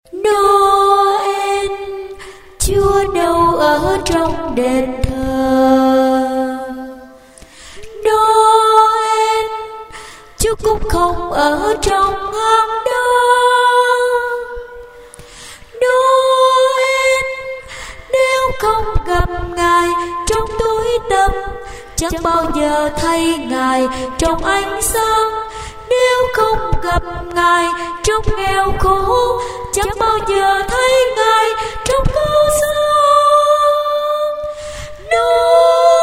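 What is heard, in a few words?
alto soprano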